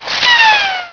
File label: whizz